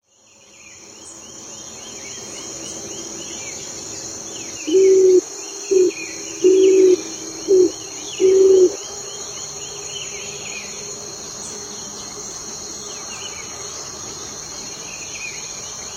Canto da pomba trocal

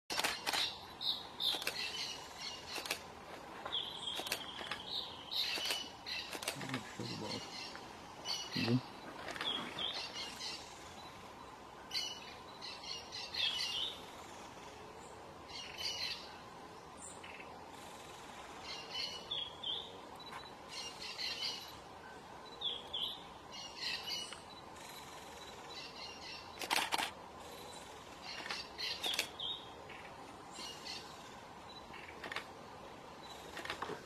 Canto do arapacu de bico torto

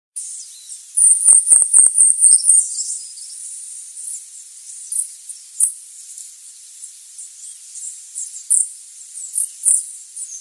canto da saira carijo

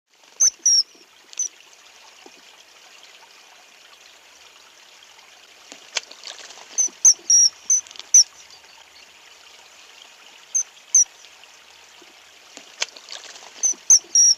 canto do martim pescador

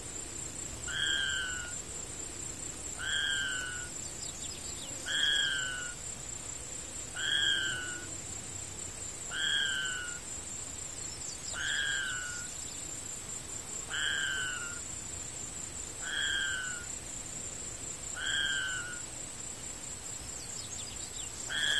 canto do maxalalaga

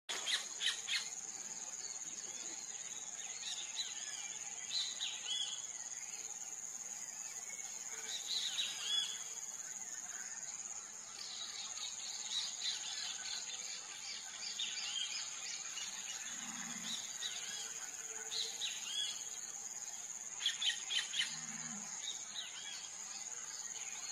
Canto do pica pau de testa branca

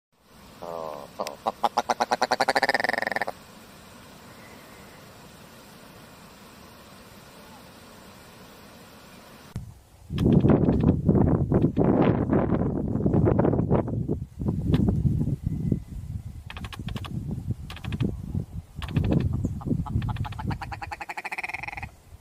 Canto do lagopode escoce

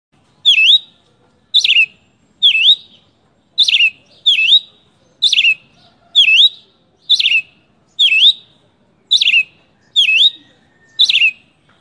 canto do tie sangue

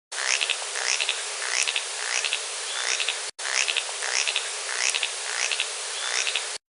Canto do anambe de garganta encarnada

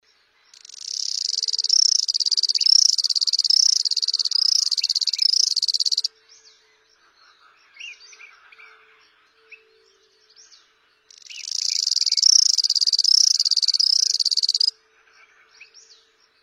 canto do lenheiro

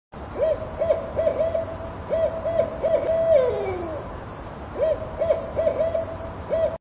Canto da coruja das torres do oeste

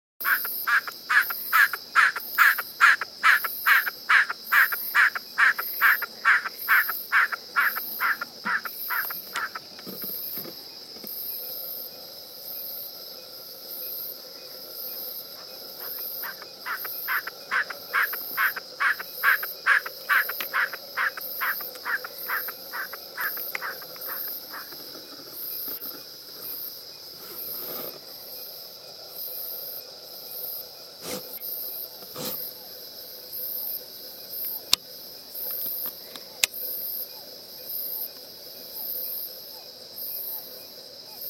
canto da narcejao o galinhola

